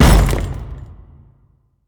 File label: ground stomp